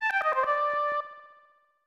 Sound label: Surprise